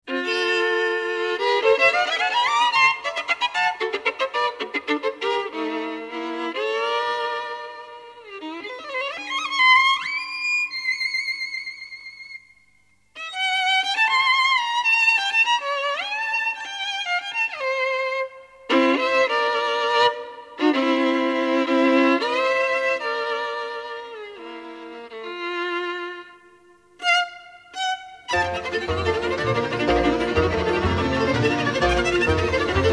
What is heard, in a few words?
Enchanted violin, music from Hungary